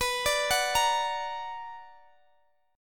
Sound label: B Minor Major 7th, Guitar, DADGAD